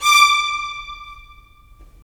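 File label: strings violins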